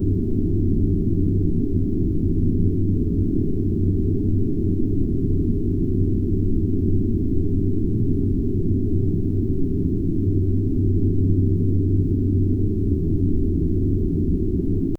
ambient background sound

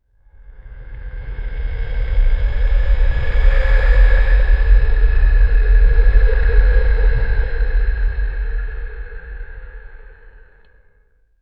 ice dragon breathing a freezing blast

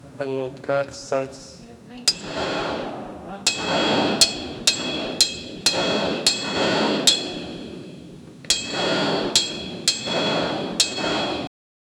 sounds of clanging metal

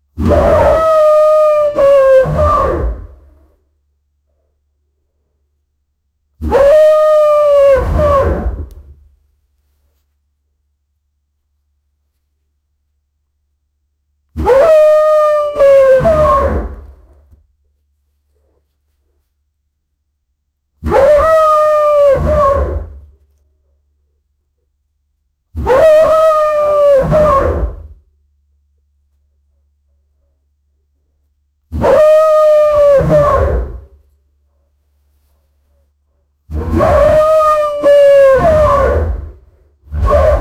grey wolf standing on met

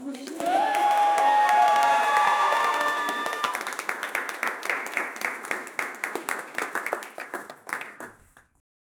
polite applause from a small audience

